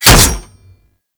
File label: Berserker Barrage Hit Metal D; Warframe; Warframes